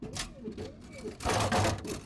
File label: Soda Machine